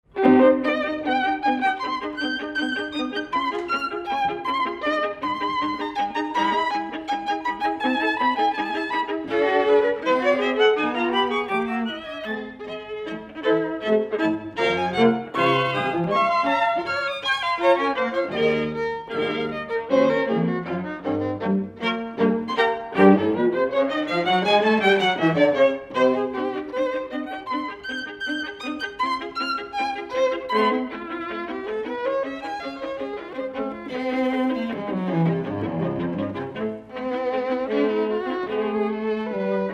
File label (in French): Quatuor à cordes; PROKOFIEV; n° 1 en si mineur op. 50; Allegro